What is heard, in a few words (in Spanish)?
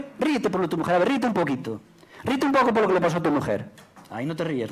mujer riete